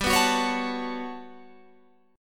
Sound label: G Major Sharp 11th G down Guitar Open G